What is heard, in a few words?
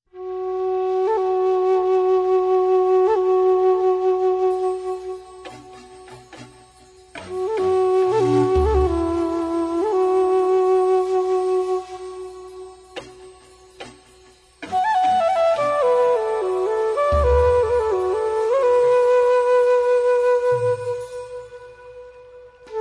Rain Forest